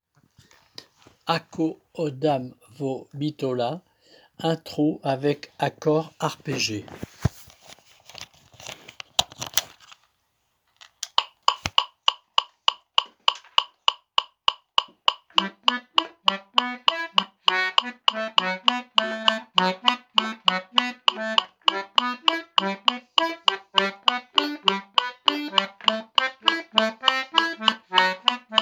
Accords arpègées

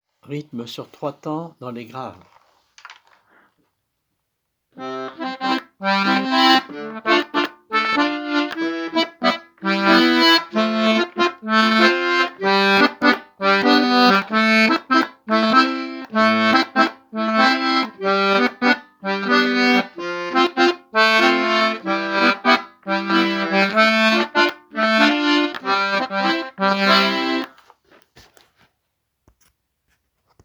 Rythme dur 3 tps grave; AkoOdamVoBitola

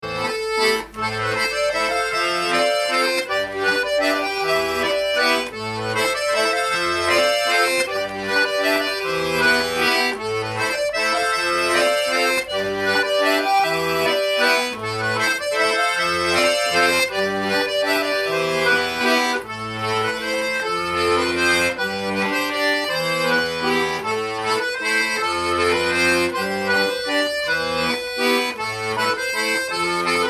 Valse A Ollu; Airs Divers - Plougrescant-IDF

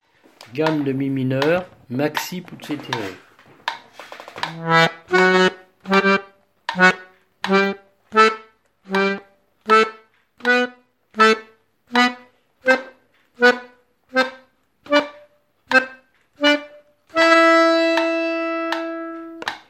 Gamme de mim maxi poussée par 3; Hora-Lautaresca

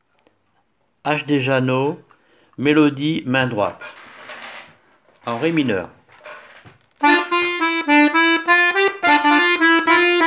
Ajde Jano mélodie m. droite

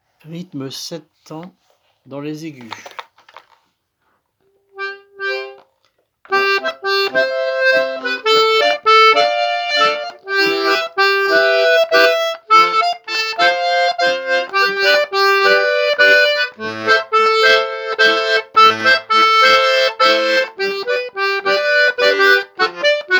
Rythme 7 tps aigu; AkoOdamVoBitola

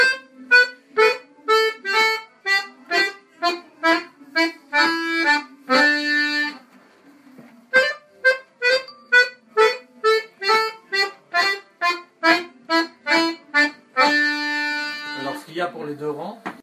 Mordants

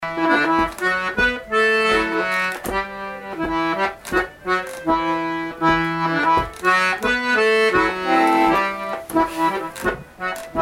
Plinn Ton Doubl; Plinns

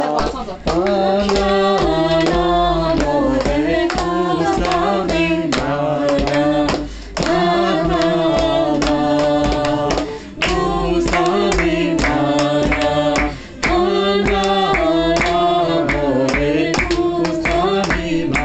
Chant + Claps; gusteMiMaglia

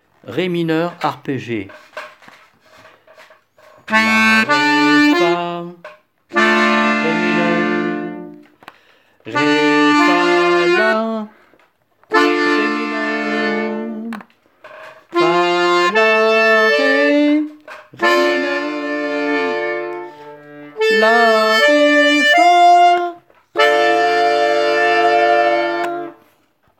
Ré m arpégé

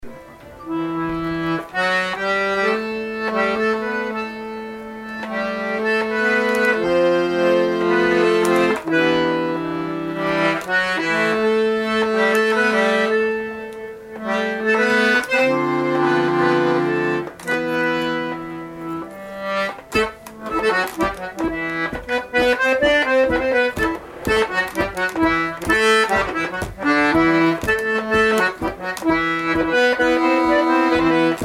Plinn Bal; Plinn-Les6

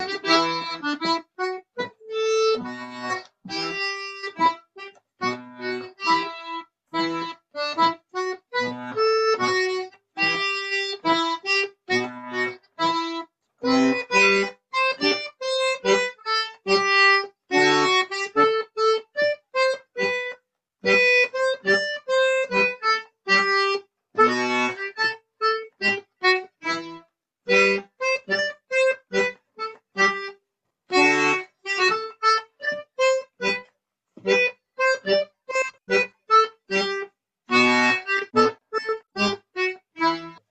Plinn Ton Double Lent; Les-Petits-Packs-Vitry

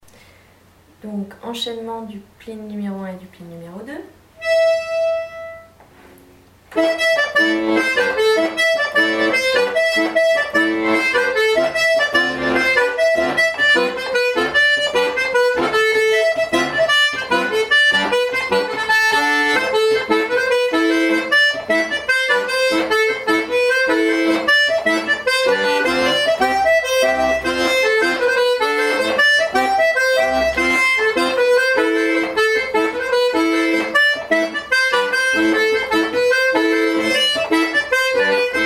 Plinn Ton Simpl; Plinn-Les6

